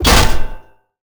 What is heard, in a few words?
impact, generic large; weapons; props dane